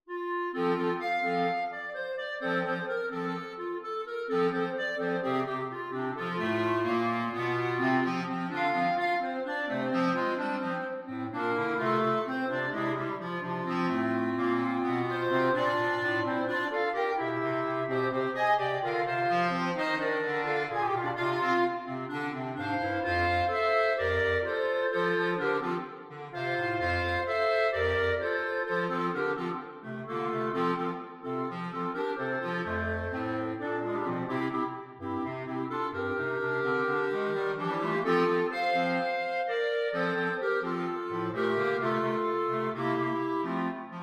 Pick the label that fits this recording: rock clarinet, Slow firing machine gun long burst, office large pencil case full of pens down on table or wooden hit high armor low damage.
rock clarinet